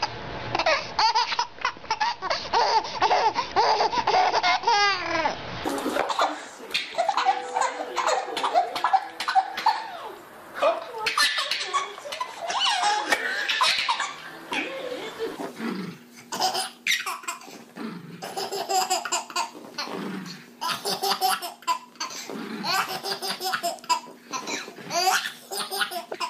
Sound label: funny babies laughing ringtone